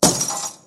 break down screen; ZTE Nubia Z5S Mini; ui

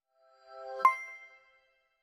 Default Email; Nokia

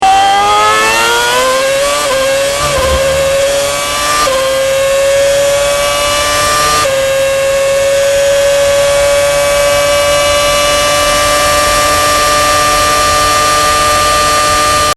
Acceleration; Red Bull MOBILE

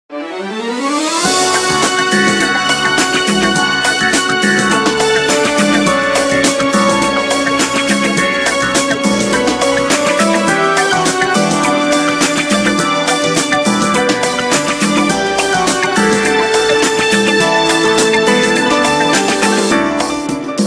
Disco Party, ringtones; Samsung SCH-W830-Magic-Hole

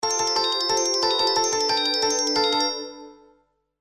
Classical New Message

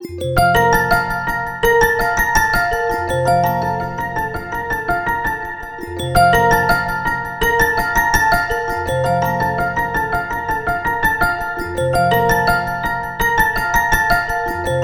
Hand Dish, ringtones; Honor; 600 Pro China

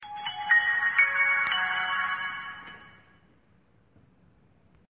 Power On; teXet; TM-121

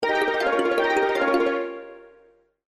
New Message; Blue; Sony Ericsson; P900

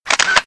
camera shutter; Sony Ericsson; Xperia X10

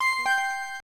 cam stop; LG

